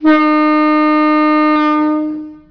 horn, trainhorn; GTW

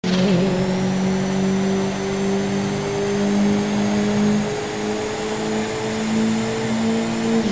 idle, car sound

